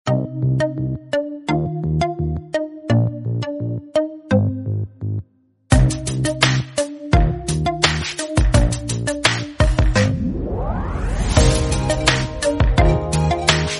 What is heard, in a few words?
stylish tonee, ringtone